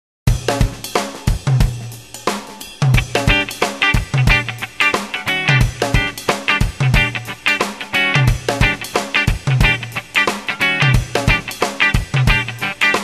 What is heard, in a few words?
Rhythm Guitar